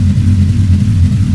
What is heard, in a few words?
snake loop, ships engine; Quantum Redshift; XBOX; yuri